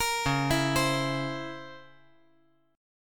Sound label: Db Minor Major 13th Db; DbmM13 arpeggiated; JGuitar